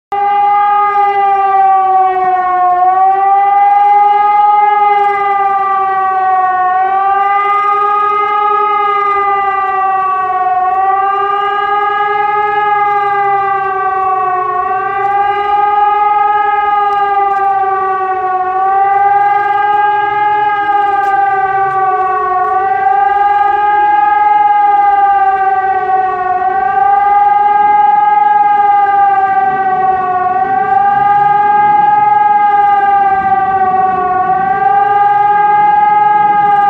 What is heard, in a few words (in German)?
Sirene Warnung der Bevoelkerung; toene